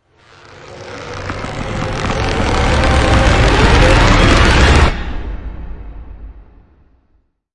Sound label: Stretch Rise; Ninja Tracks